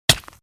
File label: land